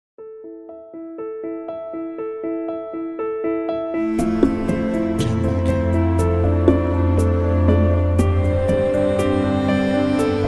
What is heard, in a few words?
Ambient Intro